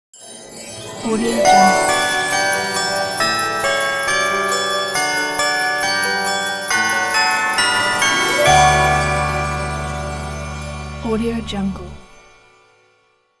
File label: christmas bells ident